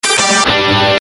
Power Chord; Music

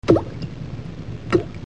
Drips

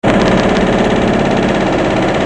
Riveting, machines

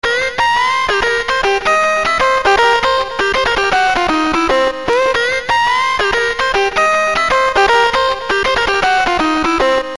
licks; Music

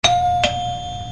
Door Bell; Doors

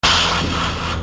Spray Can; Tools

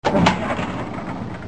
File Drawer Open

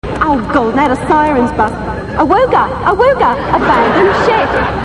Awooga; RedDwarf

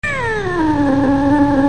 Cat

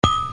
Glass Clang

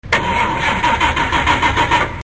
Car Starter; Machines

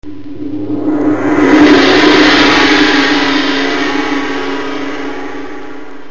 Gong

